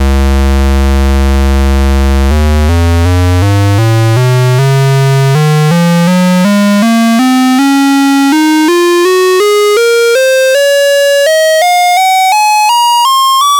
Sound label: Pulse